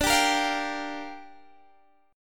G Minor 7th, D

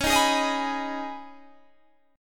Db 7th Suspended 2nd Db, down, Guitar Standard; JGuitar

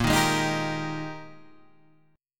Ab Major 7th Suspended 2nd Bb

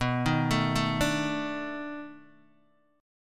B 7th Sharp 9th, down, Guitar, DADGAD

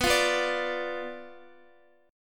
B Diminished B down Guitar; Bdim strummed

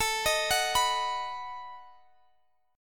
B Major A

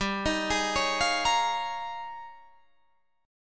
D sharp 11th G

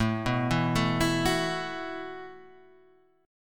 B 7th Suspended 4th A

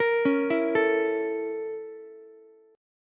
A sharp Minor Major 7th Flat 5th A sharp Ukulele; A#mM7b5 arpeggiated; JGuitar

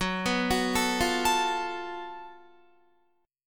F sharp Minor Major 7th Double Flat 5th, Guitar; arpeggiated; 14,14,16,14,17,16

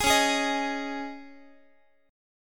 C sharp Suspended 2nd, C sharp, Guitar; C#sus2; strummed; JGuitar

